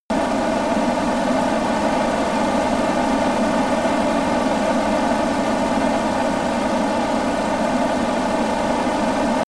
military jet eurofighter typhoon turbine idle close